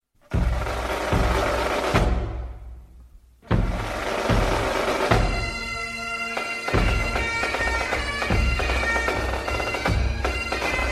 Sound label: scottish castle english ringtone